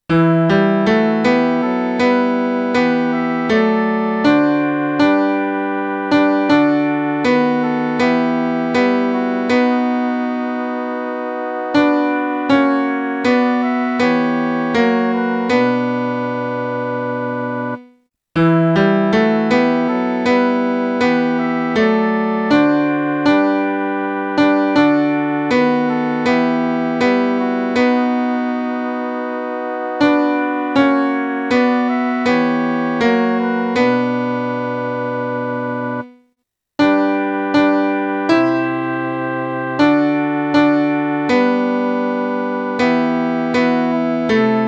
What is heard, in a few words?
midi